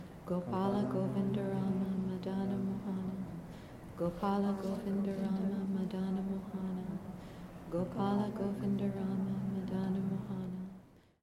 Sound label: japa chanting